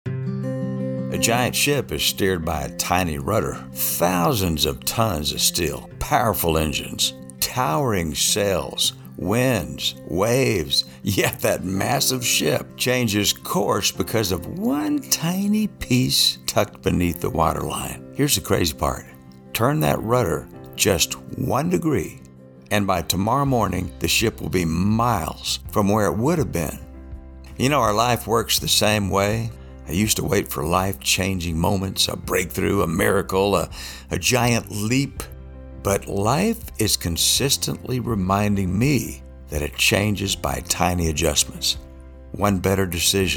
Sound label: 808 rudder